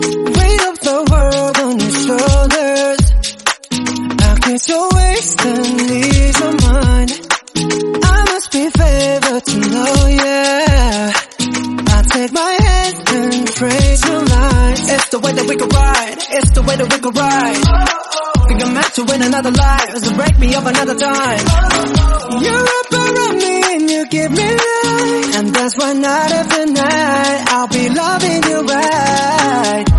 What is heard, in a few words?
English Song